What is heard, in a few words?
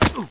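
bullet hit flesh; cstrike; player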